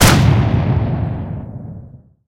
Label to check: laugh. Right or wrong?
Wrong